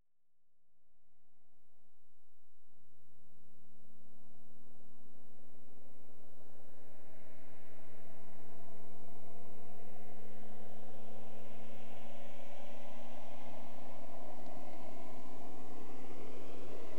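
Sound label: Ford Fiesta, 1-1 L Hatchback, Exterior; Auto; BBC Sound Effects Library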